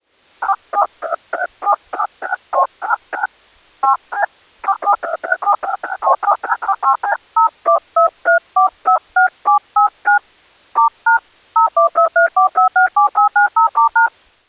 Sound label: dtmf tones dstar